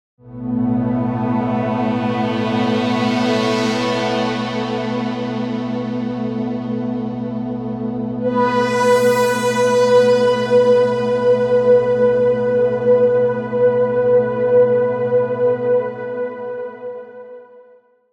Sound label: Solar Rise; Cherry Audio PS-3300